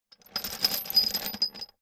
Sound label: Metal Bolts; 99Sounds; The Warehouse